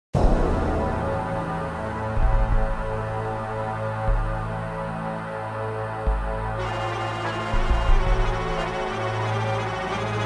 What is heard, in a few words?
Underground Tunnel Area D; Extermination; PS2